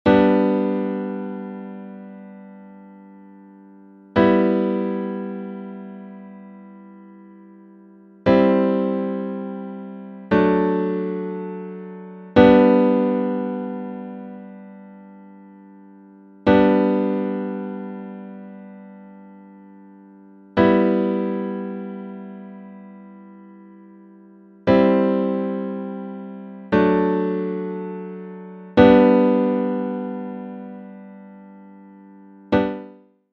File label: Grand Piano